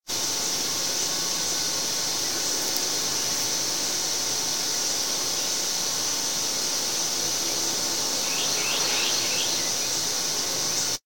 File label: beltline ambient